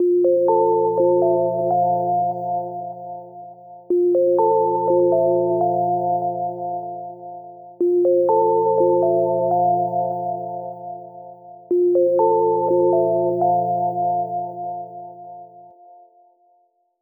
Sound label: Gentle Bells